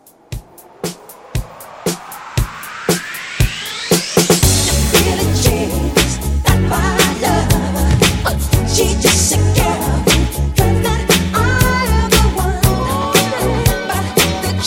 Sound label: 117 CLEAN; Epic Rebirth